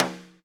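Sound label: Snare rim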